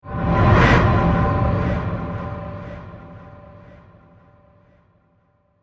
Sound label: Hit Going Backwards